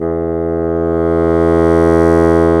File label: Bassoons; Roland LCDP04 Orchestral Winds; 90 s Sample CDs